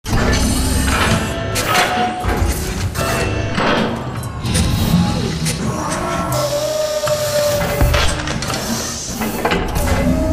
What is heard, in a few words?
Assembly Furniture Arm Close; Boomtown; Titanfall 2